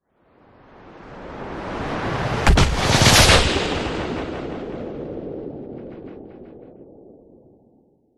sound design whoosh slow into explosion

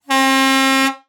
Fire Truck Horn; Blastwave FX

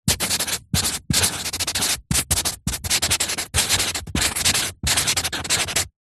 marker pen writing on cardboard sheet; office

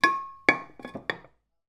household teapot china lid remove and put back on

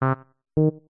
synth bebot sawtooth robot low beeps; Jessey Drake

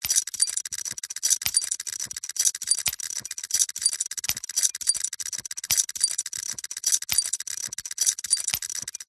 science fiction steampunk gears turn fast; zapsplat

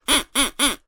cartoon duck quacks whistle angry

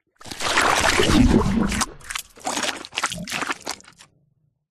cartoon slime big squelch gurgle; zapsplat

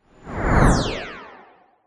cartoon anime whoosh rush power laser

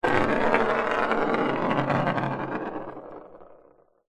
foley ballbearing roll across wood surface; zapsplat

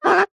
foley plastic squeak rub finger single

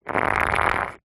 foley stone scrape scratch mark concrete; zapsplat

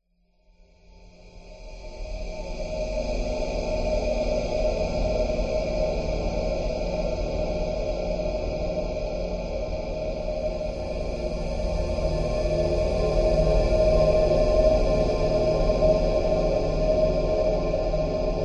horror atmosphere dark sinister evil humming

